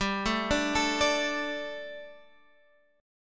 G Suspended 2nd, Guitar Standard; arpeggiated; 15,12,12,14,15,x

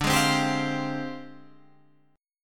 F Minor 6th Add 9th, guitar chord

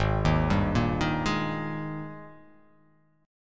Ab Major 7th Suspended 2nd Ab down; arpeggiated; JGuitar

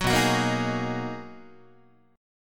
B Major 13th, down, Guitar, BM13 chord; strummed; JGuitar

